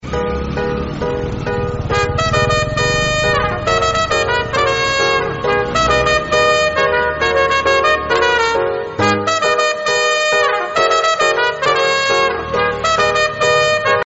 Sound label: music ringtone; super khiladi3